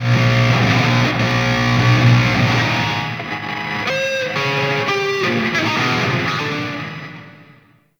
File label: Stadium Sound Check Guitars; Warframe; Ambience; Nineteen Ninety Nine